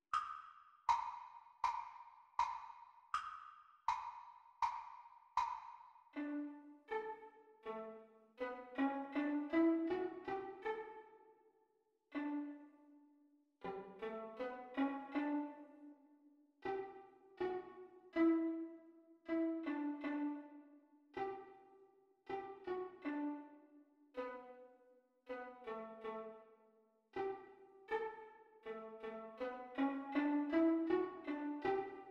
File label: Viola Duet Playalong Practice Speed